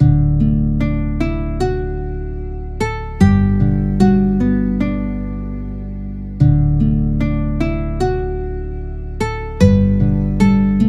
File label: Calm, Peaceful Days